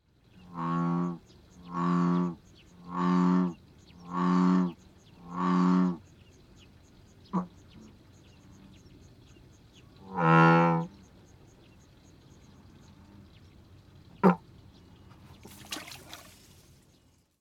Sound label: ushi frog